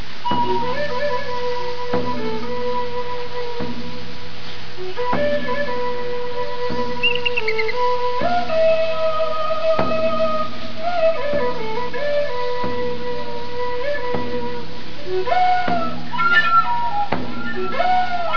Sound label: eagle